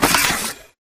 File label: spectre table slam spectre throw; Titanfall 2